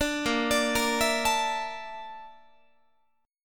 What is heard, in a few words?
A sharp Major Sharp 11th, Guitar; arpeggiated